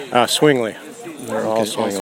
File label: dog